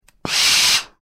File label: Iron Steam